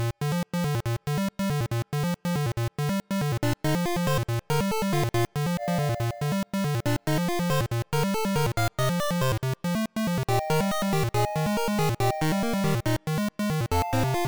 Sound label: Picking it Up (140BPM)